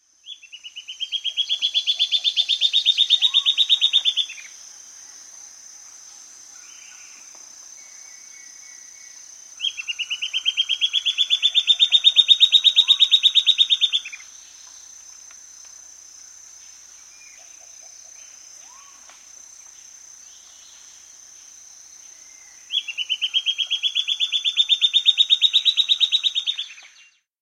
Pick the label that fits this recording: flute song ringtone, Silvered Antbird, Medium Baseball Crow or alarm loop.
Silvered Antbird